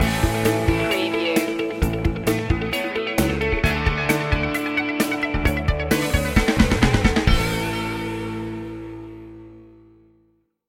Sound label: raise me up stinger